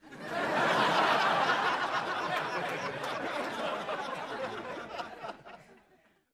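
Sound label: Crowd 100 Medium Burst Interior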